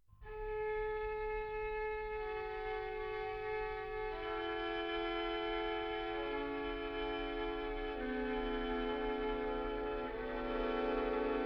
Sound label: Quartet C Major, String Quartets; Quartet No. 4; Béla Bartók